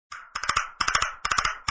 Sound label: castanet